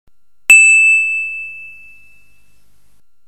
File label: Sms ring